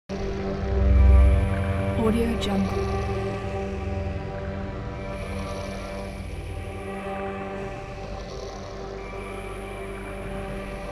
drone sound

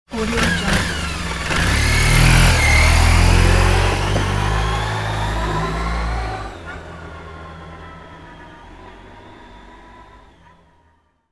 city motorbike drive away; fxprosound

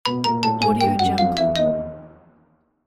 game over; kazoomx

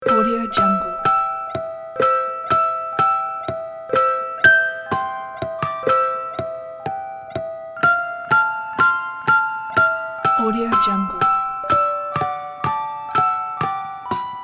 jingle bells real musicbox

